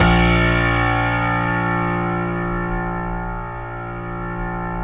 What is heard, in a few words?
StereoGrand, Keyboards